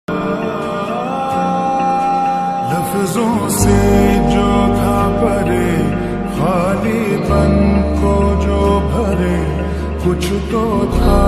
slowed ringtone

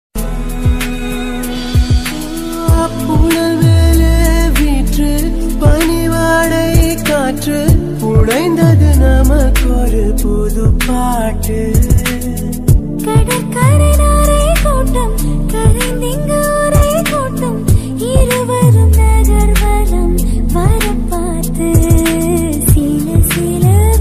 song bgm ringtone tamil love bgm ringtone melody; engaiyum kadhal

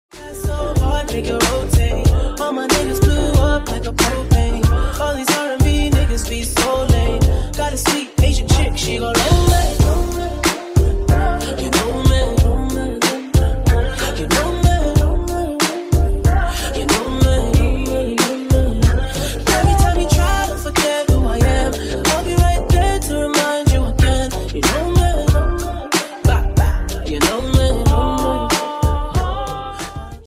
remix

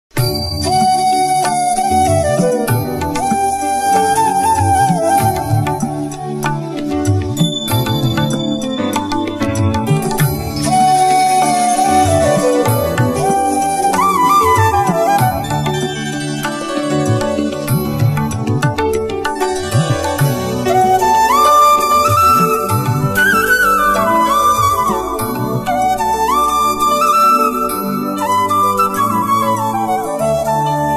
evergreen background flute music; maddy

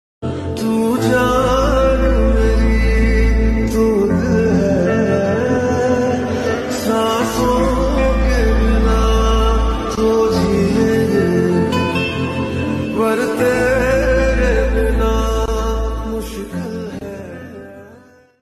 sad ringtone; ek villain return

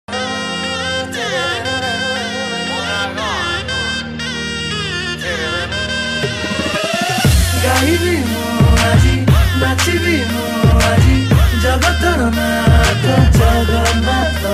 odia song ringtone bhajan ringtone; kalia 2; jagannath

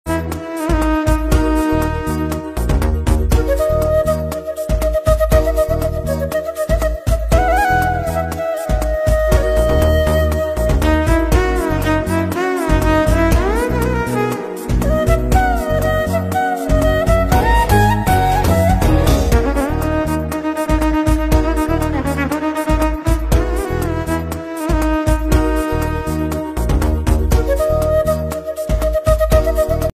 violin flute

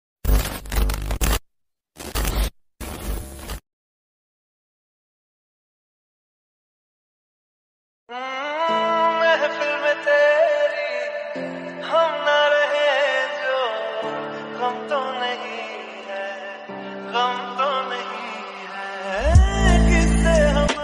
lofi flow ringtone romantic ringtone

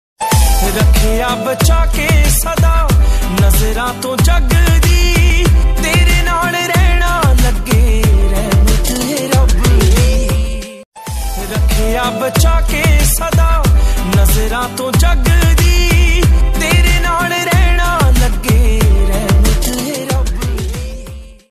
punjabi song ringtone

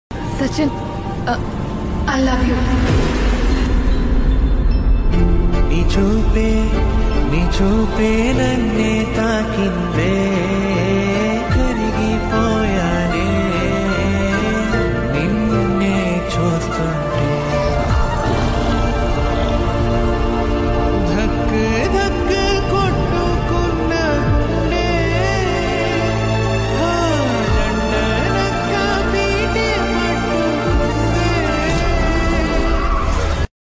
telugu movie climax bgm; Premalu

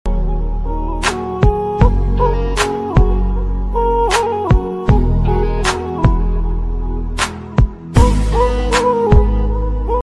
background instrumental tone best flute ringtone

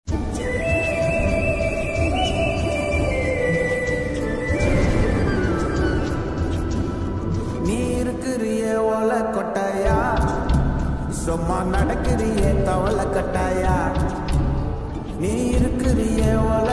song ringtone; raayan

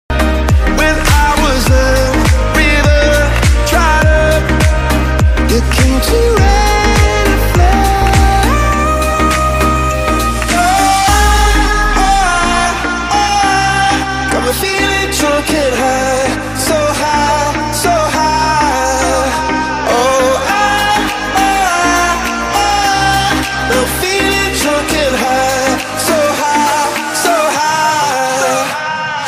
romantic love status tamil; caring girlfriend

